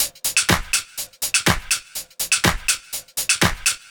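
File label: 123 Minimal Funk No Bass Drum, Drum Loops; 123bpm; Nu Dance Electro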